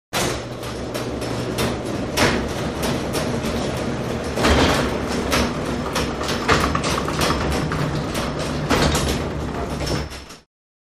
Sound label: Elevator; Electric Lift / Hoist Start, Run And Stop.; Metal Gate